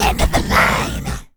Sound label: deathmetal skels; Crypt of the NecroDancer